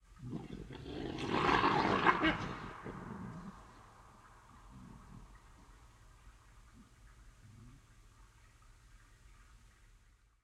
wolves fight autumn, forest ambient animals night; dynamic soundscapes

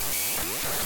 ui glitch glitching digital data corruption loop

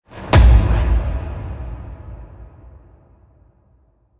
boom impact hit cinematic; chef audio; cinematic sound fx

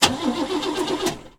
cadillac 1960 start fail; fvtt sonniss gdc audio

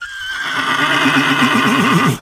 horse whinny; Creatures; Animals

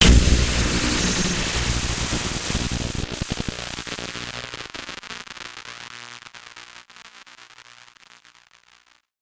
magic spells impact electricity; sonniss gdc

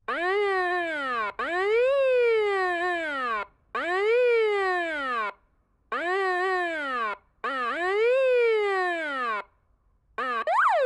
police siren type 1 close; sonniss gdc

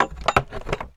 old lock fiddling; sonniss gdc

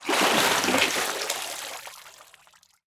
Water Step Out; baileywiki maps premium towns

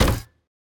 robot footstep single; sonniss gdc

